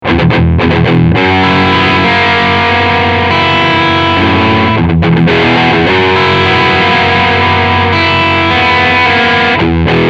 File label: Med Gain